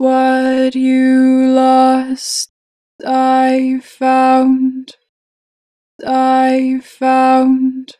Vocals, Pitch Flat, Lost, 4 bar flat; Harmor Vocals

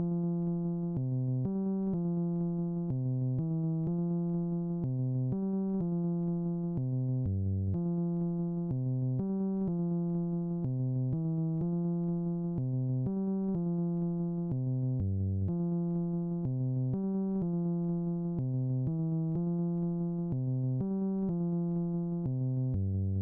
124 BPM F Min Synth Bass, loop stems; Melody; Cymatics; 2022 Melody Collection Platinum Expansion - Pt 1; Circus Trick

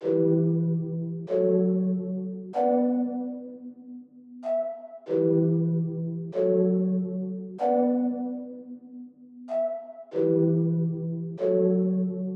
95 BPM F# Min Keys, Latin; Cymatics; PARADOX II; Melody Collection; Amazon

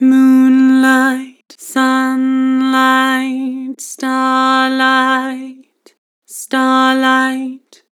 Vibrato Moon sun starlight A, Harmor Vocals

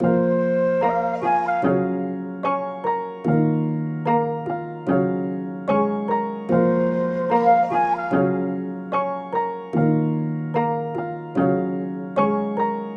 148 BPM A# Min, Hip Hop Melodies Nostalgic; Hearteater

